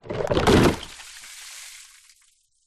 household plastic bucket full of water knock over on wood floor